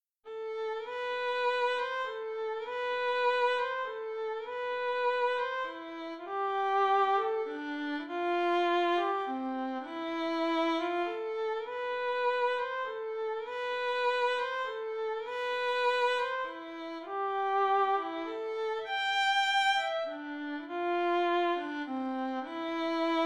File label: Soliloquy for Violin